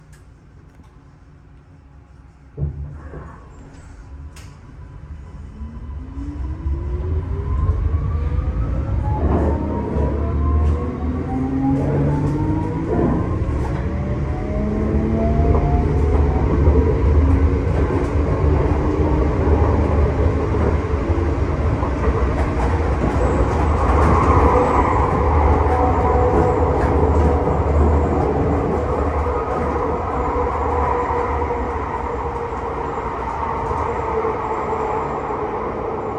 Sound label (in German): Fahrsound T Wagen Citadis SX05